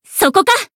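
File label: Voice attack, Japanese; isuka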